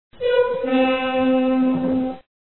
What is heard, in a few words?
music